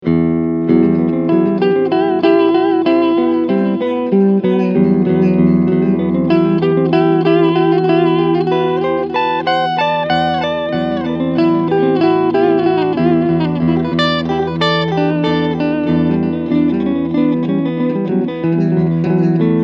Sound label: Medium Delay